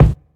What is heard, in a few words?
deep steel kick drum sample a sharp key